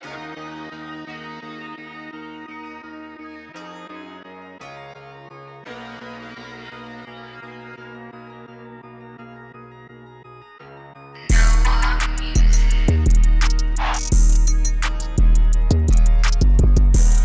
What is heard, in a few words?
Dm 170bpm